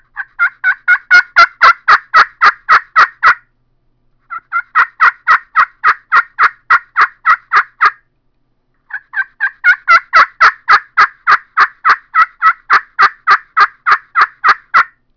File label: super bronze yelps, turkey; Southland